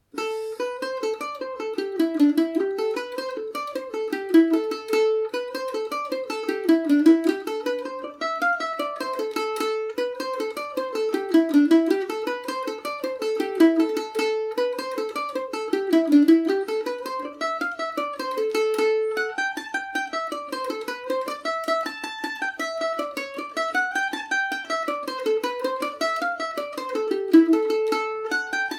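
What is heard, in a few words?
A minor, Irish mandolin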